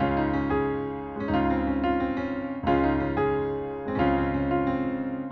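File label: piano reflective vamp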